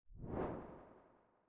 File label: woosh